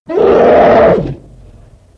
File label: charger roar, zombie; cs16; cstrike; basebuilder